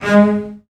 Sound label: CELLOS A#3, Strings; ILIO; Synclavier Strings